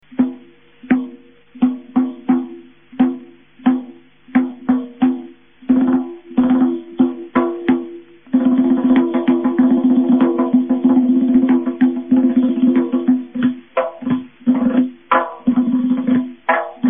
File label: tombak